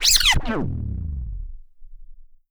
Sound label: back spin med, vinyl record